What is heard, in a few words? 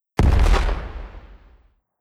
Rocket Launcher Close Impact; caverners game design